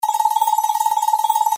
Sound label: rollup loop; Lucky's Fish and Chips